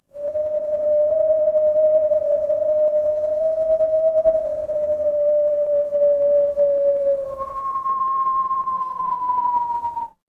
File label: ambience wind